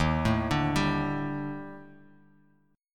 D sharp Major 7th Flat 5th, down, Guitar, Half Step Down